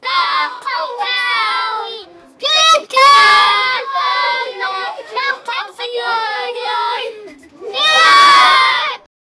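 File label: elementary school, chanting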